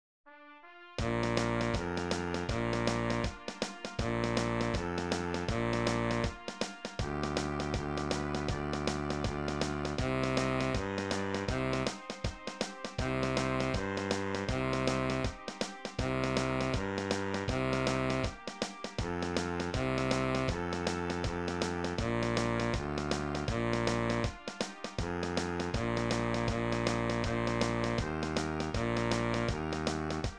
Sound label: tuba